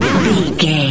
retro teleport warp effect

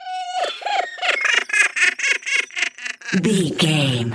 goblin fairy laugh angry

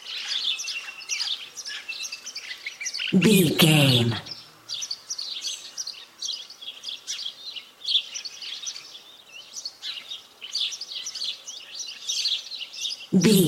birds small calling chirping loop

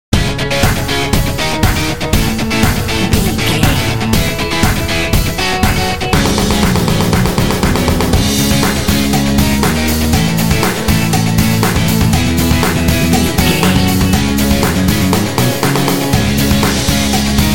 indie funky dance bumper

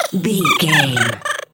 goblin fairy laugh high

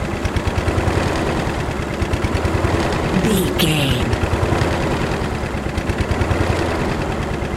engine dying slow loop

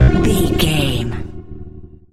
retro fail sound

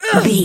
voice female hurt pain

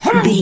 voice male effort jump

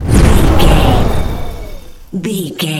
wind blizzard storm spell blast

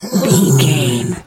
bear roar soft

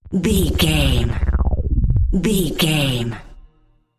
dark minimize

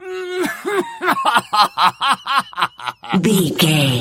voice male laugh

